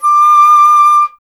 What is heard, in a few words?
FLT FLTD, Flute; Miroslav Vitous - Solo Instruments VOL-2